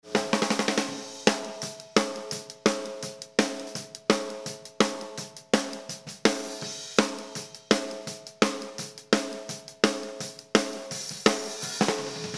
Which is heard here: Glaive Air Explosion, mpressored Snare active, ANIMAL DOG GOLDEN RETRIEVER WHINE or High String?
mpressored Snare active